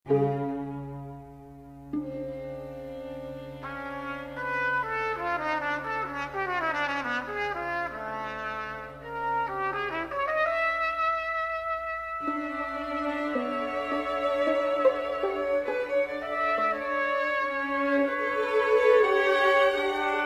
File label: Quintet for Trumpet and String Quartet